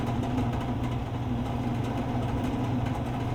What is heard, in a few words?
vending machine; Golden Crayon Sounds